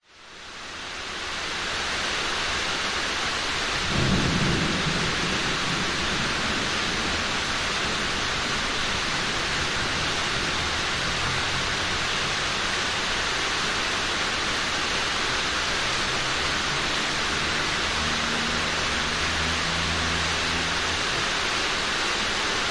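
weather rain warehouse heavy rain on roof increasing slowly occasional traffic outside; zapsplat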